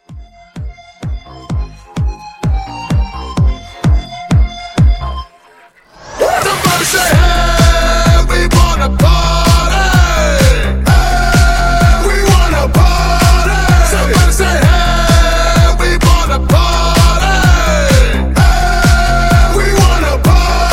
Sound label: Party Starter 128